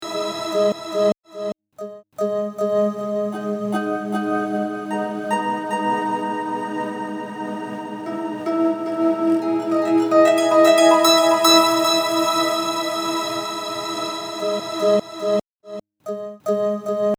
Up An Octave

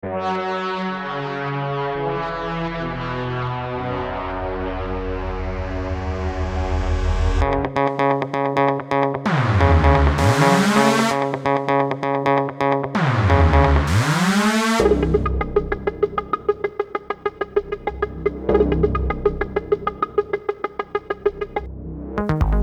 synths

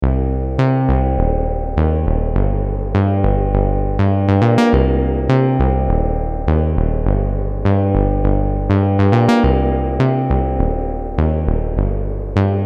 Bass Syn Spring

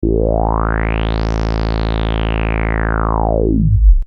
self osc

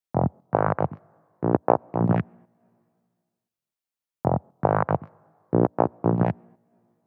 voc low

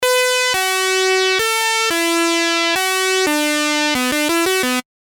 lead

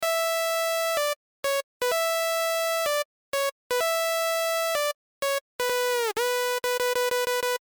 Weekend Lead

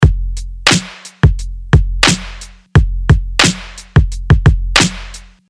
drums

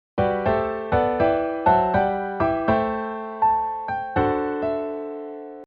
polka voicings

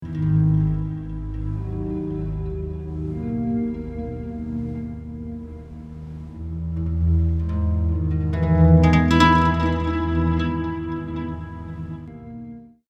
Octave Down

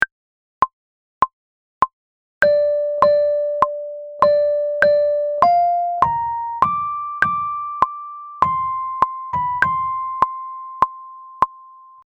heres that lead

